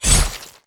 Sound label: knife hit; weapons; kuilu